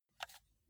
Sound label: console controller arrow key press fast